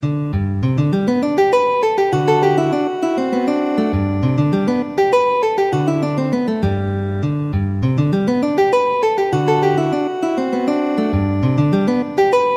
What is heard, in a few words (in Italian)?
valses, guitar